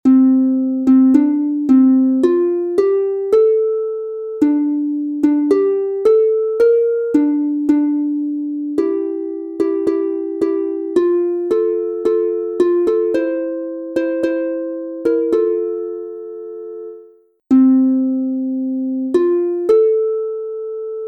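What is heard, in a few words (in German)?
F Dur instrumental, Harfe; Soldaten und Marschlieder; O du schoener Westerwald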